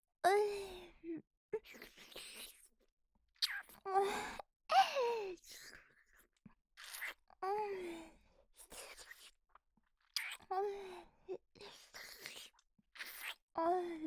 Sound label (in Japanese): ディープキス